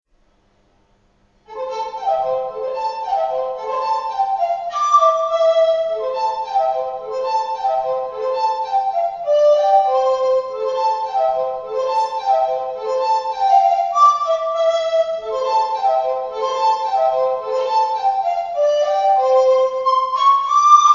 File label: Heel And Toe Polka; MelBay